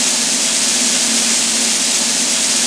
A#3 DISTN, DRUM; Northstar; The Wizard VOL-1; DRUM INERTIA

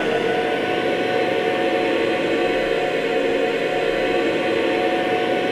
AVANTE G, Voices

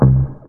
Single Acoustic Basses

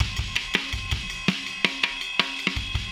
Process Beat, Hip-Hop Beats

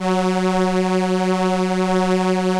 F#1, ARP STR ENSL, Vintage Keyboards; Big Fish Audio - First Call Vintage Keyboards

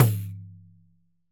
Pandeiro open; Best Service; Festa Latina VOL-1; Single-Hits

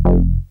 HIP BASS, HIPHOP; Rubber Chicken Software Sample Bank

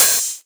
Open HH, Cymbals

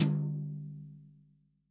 TOM, REAL DRUMS, SWING KIT; Best Service ProSamples vol.43; Real Drum Kits

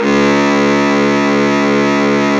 SAX Baritone SAX, FX

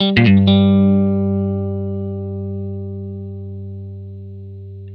RIFF, Vintage Blues Guitar; USB Soundscan vol.22; AKAI

